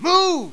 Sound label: move; action-quake2; marathon